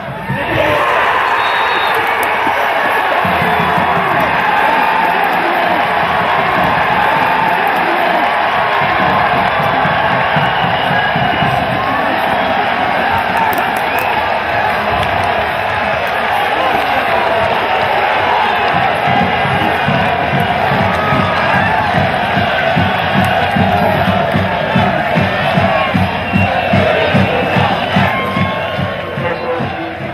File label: goal fanchants; galway